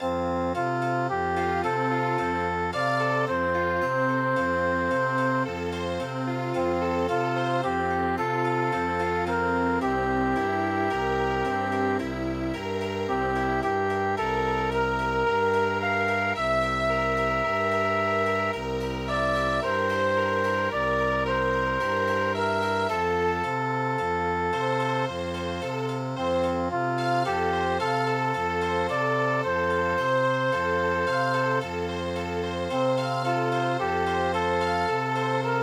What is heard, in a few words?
Chamber Music; Pytala Sie Pani